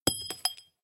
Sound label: shells 20mm ground; guns; tanks; War Thunder